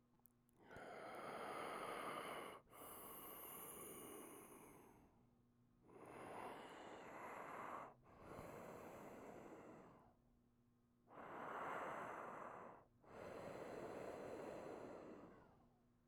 Man Breathing

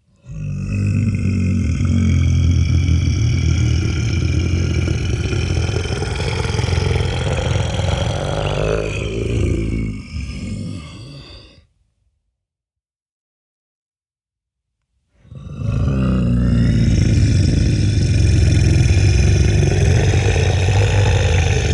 Monster Roar

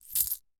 Coins

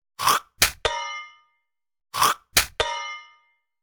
Spit In Spitoon Comedy

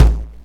Deep kick light dist

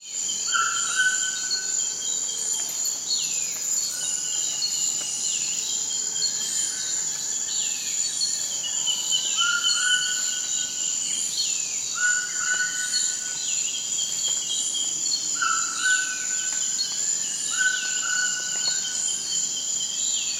Dawn In The Amazonian Rainforest

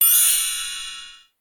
Fairy Magic Wand

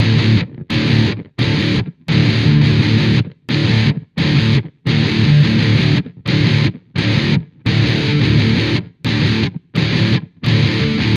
Metal Guitar Phrase

